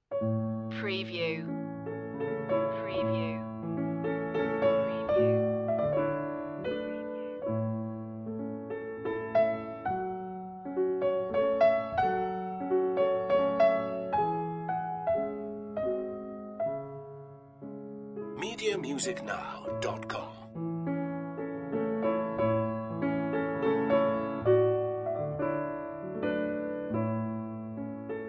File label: brahms waltz in a major op 39 no15; piano concert